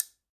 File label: Guiro Top; Latin Percussion; Drum Hits; Wood; Ableton; Factory Packs